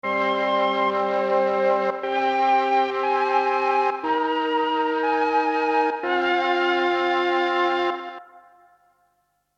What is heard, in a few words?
RE-20 Three Head